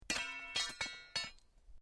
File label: metal rod fall; Metal (Sound Effects:Materials)